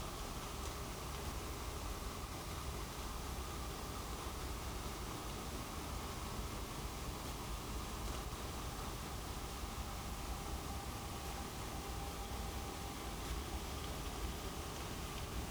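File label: hydrophone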